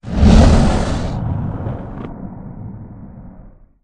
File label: roar of dragon; animal